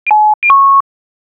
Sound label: fail sound that shows